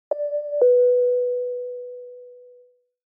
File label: Flight announcement tone airport departure double